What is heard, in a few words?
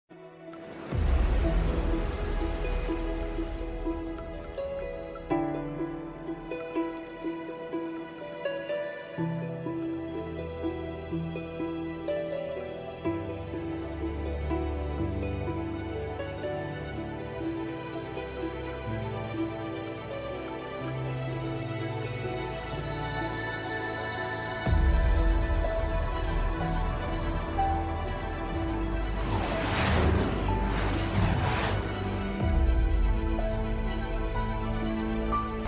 theme bgm; adivi sesh; saiee manjrekar; major movie